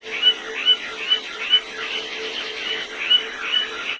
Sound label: birds